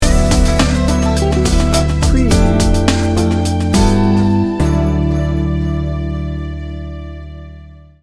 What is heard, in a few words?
mellow chill jazzy sting bumper